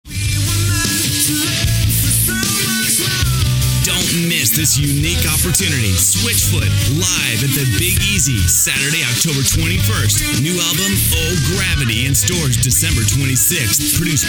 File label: Concert